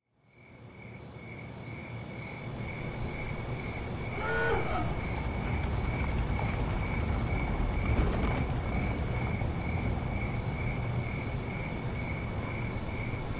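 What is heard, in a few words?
amb, slots; pork knox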